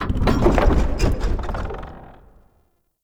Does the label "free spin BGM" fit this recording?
No